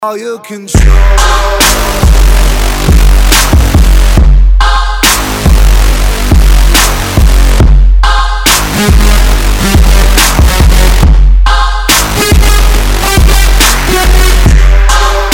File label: dubstep; ringtons